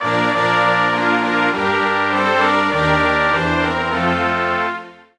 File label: Sweden, Fanfare; 1997 MMX LAN PACK (win 95); Worms Armageddon